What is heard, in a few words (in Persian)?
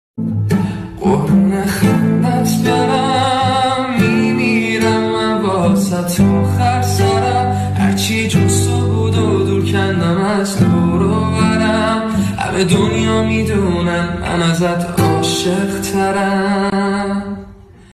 Gitar